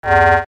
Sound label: fm freq, synthesizer